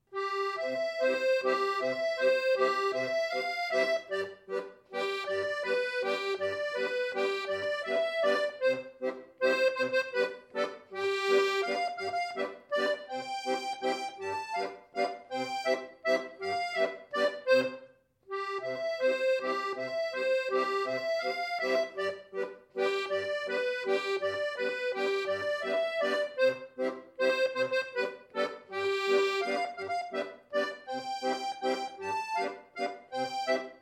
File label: Marsigliese di Tredozio; Club IIIM